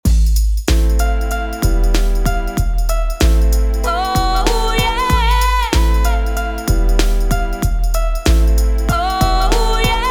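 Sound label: Sidechain Compression Tips Vocals to Instruments ON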